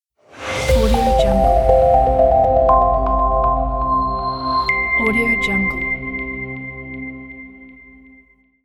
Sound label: clean logo